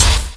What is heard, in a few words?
Otherworldly Hit